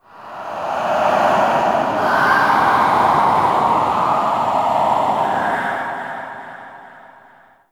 Death Wind Gust Low; Ambience; One Offs; Red Night

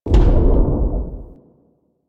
Step A; The New War; Sentient Throne Room Gameplay; Erra

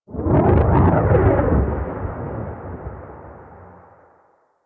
Thunder One Off, ambience; Vitruvian Memory

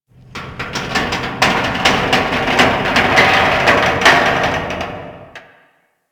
Derelict Metal Creak Slow; Warframe; Orokin Derelict

